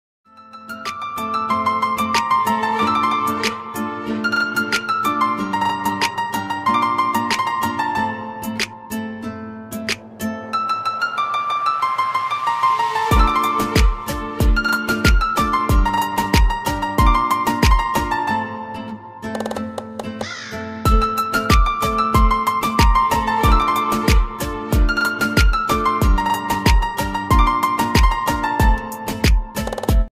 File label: Turkish Violin Ringtone